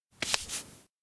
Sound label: hold hands; spica; anemoi パッケージ版 1.1